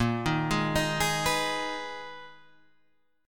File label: Ab Minor Bb, Abm/Bb chord; Abm/Bb arpeggiated; JGuitar